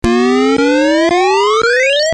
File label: power on, arcade; CRYSTAL